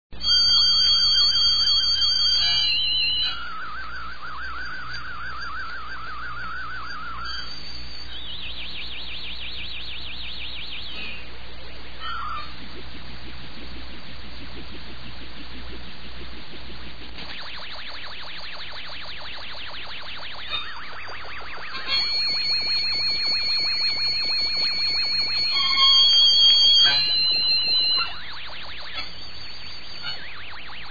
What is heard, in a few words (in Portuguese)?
toque